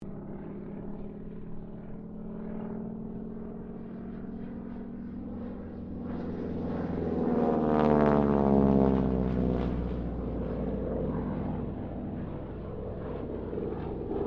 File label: Harvard squadron 4 pass, stereo; Mixed aircraft squadrons; Airplanes; Vehicles